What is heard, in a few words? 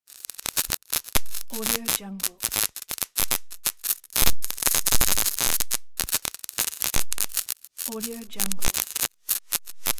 electricity short circuit long